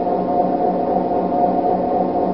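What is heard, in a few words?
hallway ambience; hl2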